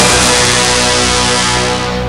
EMULATOR; Rubber Chicken Software Sample Bank

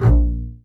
A#1 SPICCA, ARCO BASS

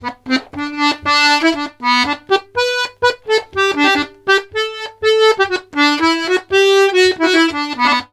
POLKA, Accordions; USB Soundscan vol.40; AKAI

